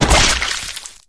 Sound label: flesh destroyed medium; flood